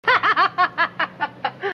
laughter

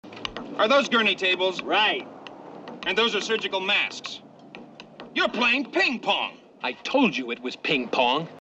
ping pong

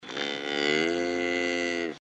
moo

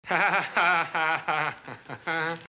laughter

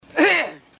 clear throat

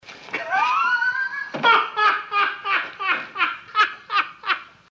laughter

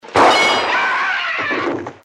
scream

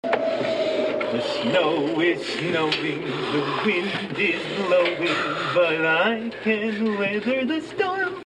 singing

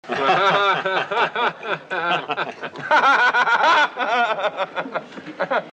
laughter

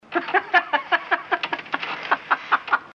laughter

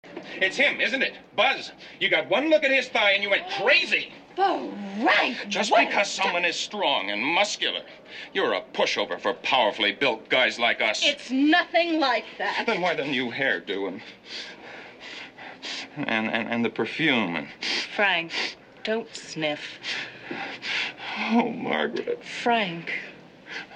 dripping and sniffing

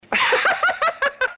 laughter

